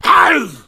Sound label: hunter shred, attack voice